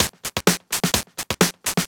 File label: FX Loop 128 BPM, House